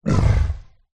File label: horror monster grunt wide stereo